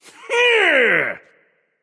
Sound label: nv yay; smite; voicepack; xbalanque